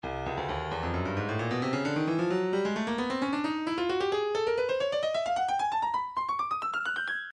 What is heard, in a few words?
breakbeat midi files 130 139 bpm 132; Stylus